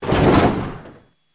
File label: Jeep Land After Jump, hits & rattles